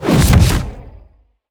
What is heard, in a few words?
Cannon Explosion; helltaker